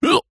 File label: human male hiccup single